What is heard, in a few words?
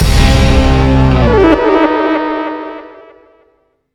level end; Pig Eat Ball